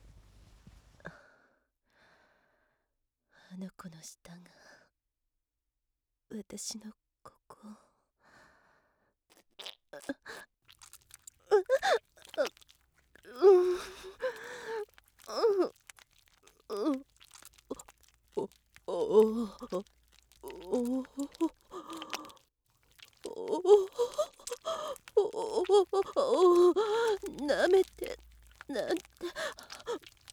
アナルオナニー＆キス 効果音入り

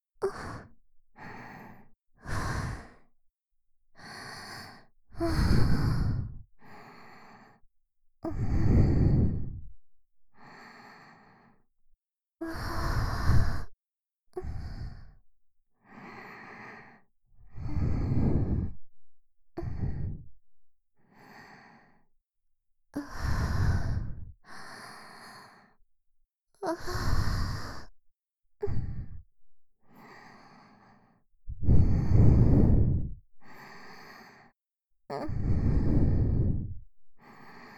甘い吐息ループ

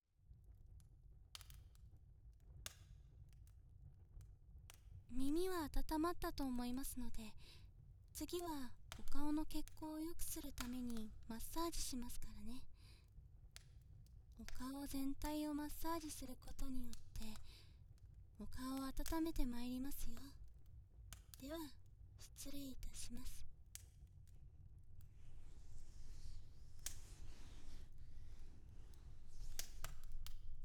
オイル で 顔 を マッサージ する 音; 極寒の山中にて

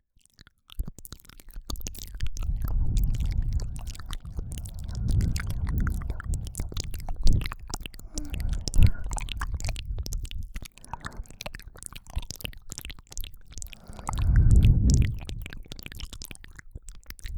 うるおいたっぷりのマウスサウンド, ASMR; 両耳Ver

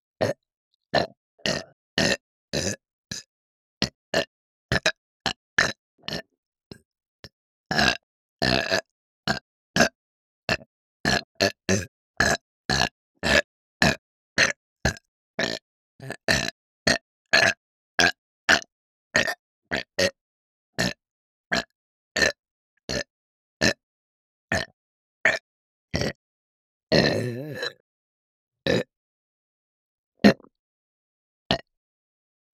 ゲップ; リーナ; ゲップのみ

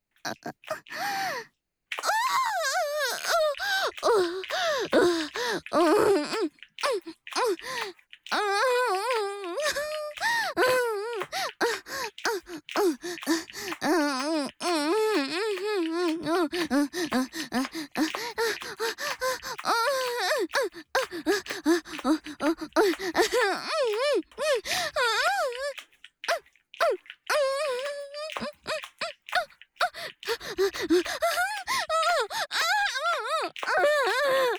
オホ声（SEあり）娇喘

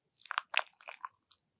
くちゅ音, ASMR

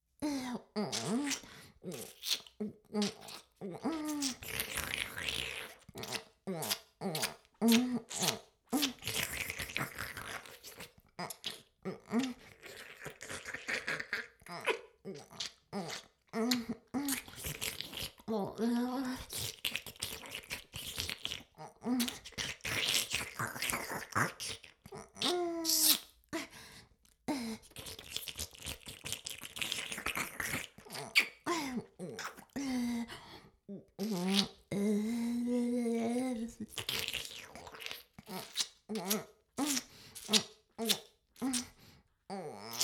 フェラ音声; 魔法少女マスカリマッスル

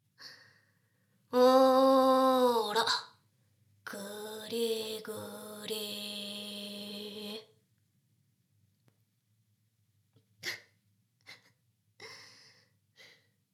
しこしこ, ASMR; ダウナー風俗

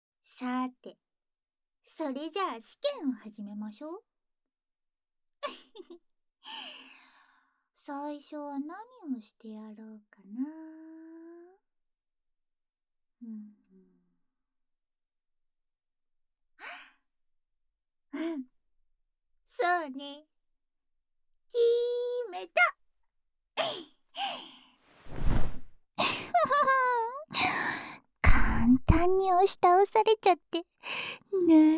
耳舐め乳首舐め×手コキ; ASMR; あははっ、チンポビクビクさせて情けな〜い♪

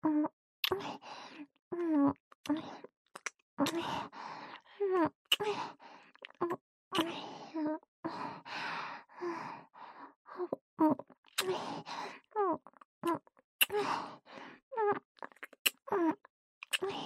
キス; 美星; ラブラブキス

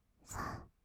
"さ", ブレス, 吐く音, kawaii voice; ASMR; 1200 kawaii voice!!

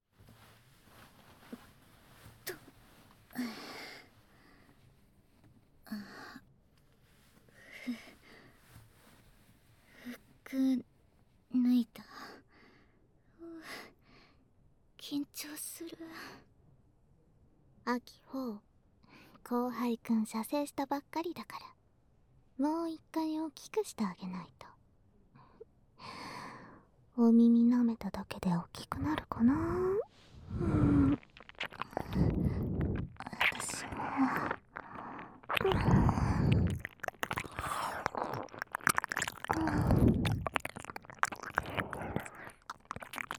耳舐めサポート; 初めてエッチは耳舐めサポート付き

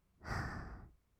あ, ブレス 吐く音; 1200 kawaii voice!!; ブレス 53種

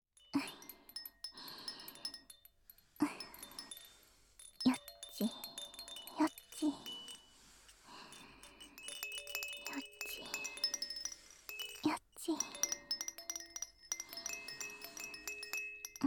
ガラガラ（横から）; ASMR; えろまま

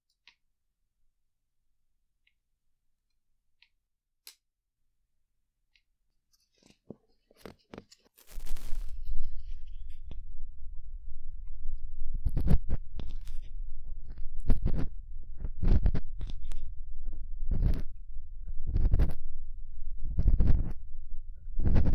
丁寧さ重視のリアル匠耳かき音

